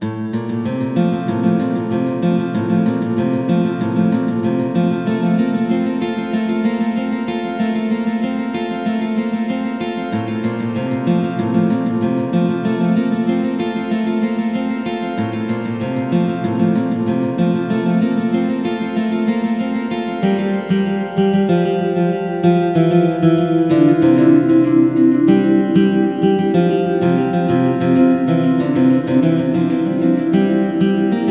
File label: Guitar